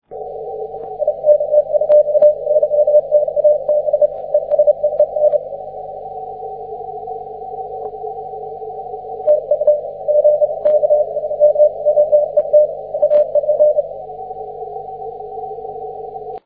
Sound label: cw; 20m